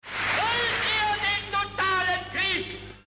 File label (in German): Klingelton